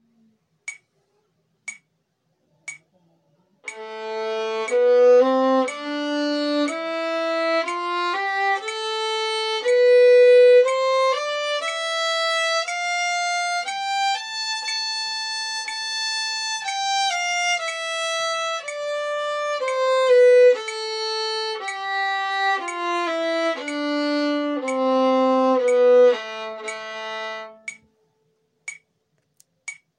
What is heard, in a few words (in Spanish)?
Escala de la menor en negra, corcheas ligadas y negra con metronomo